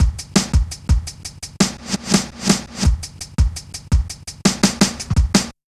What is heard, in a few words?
Step Ez, Drum Loops, Jungle, 168.5